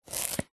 food sweet potato single chop knife wooden chopping board; zapsplat